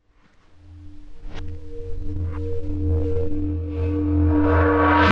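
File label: percussion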